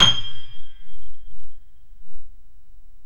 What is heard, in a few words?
KEY, Steinway ff; Steinway M